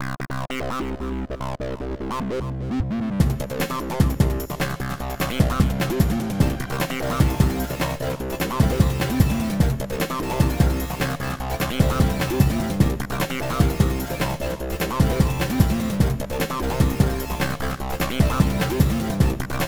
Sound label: groove